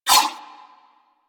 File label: Nunchaku Prime Whoosh; Warframe; Tenno